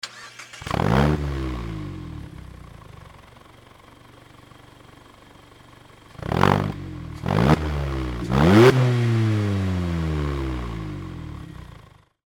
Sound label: nd roadster fujimura bf; nd5rc